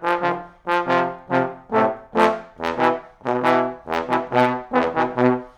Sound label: trombones